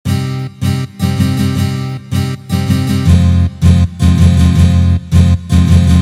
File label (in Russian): boj na gitare